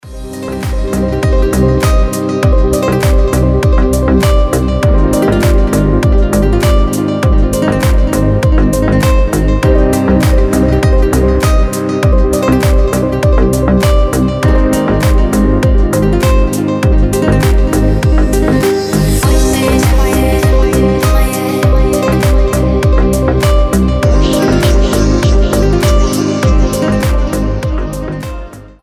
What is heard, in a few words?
soft deep voices; NMG, Samelo, Soft Deep - Voices